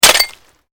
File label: GLASS SHARD SMASH